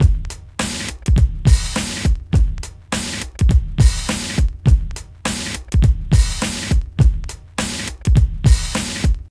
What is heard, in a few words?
Hard HipHop Drums; Pro Tools Essential Loops; Digidesign